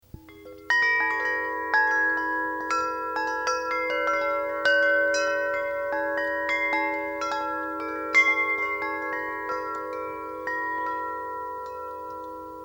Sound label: chime